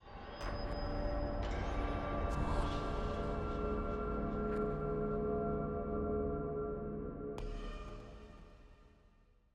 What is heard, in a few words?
Apparition, Multi; Universal SFX